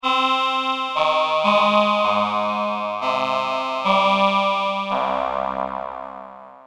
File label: vocal